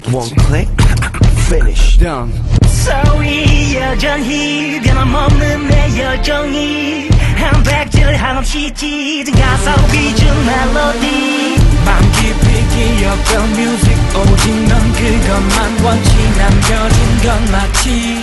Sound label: shout out